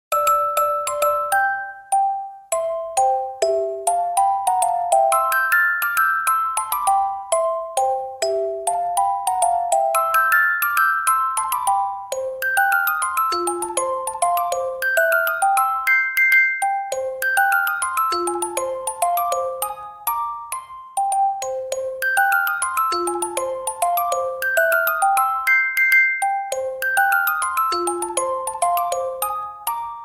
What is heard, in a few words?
Overworld Night; SMM2; SMB